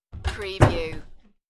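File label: Armoire Door Shut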